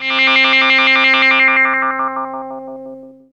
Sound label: EFFECT, Explosive Jungle; EFFECT 1; USB Soundscan vol.05; AKAI